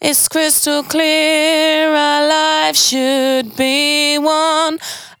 92bpm Bb, Velvet Vocal; Zero-G

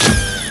POWER SLAM; Northstar; The Wizard VOL-1

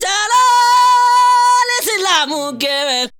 VOCAL LINES; Best Service; World Colours

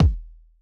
kick, Roland MC-909

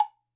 COW, Percussion; Sampleheads; NYC Percussionworks; GJ PERC VOL1

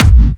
Clubby Kicks, Bassdrums

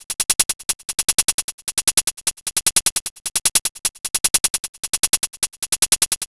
114 PERC, PERC LOOP, Fresh Disco House; USB Soundscan vol.20 - Fresh Disco House I

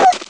ugh; ST-XX Amiga Soundtracker Sample Packs

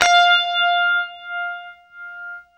UNIVIB F, Guitar; East West; Steve Stevens Guitar Samples Collection

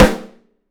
MUTD BRASS; 3D Drums

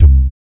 BS DUM, Vocal Planet Jazz & FX; ILIO; BASS DUMMS

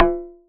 FX JUG BND; ModelCycles

